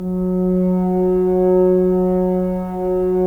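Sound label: Solo French Horn, Brass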